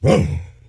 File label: Attack; Ley Guardian Eregos